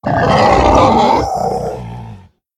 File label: Daeodon sound